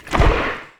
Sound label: paint blob inception; Portal 2